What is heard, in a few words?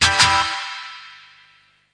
two strum ringer, Guitars